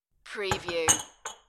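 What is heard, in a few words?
Stack Glass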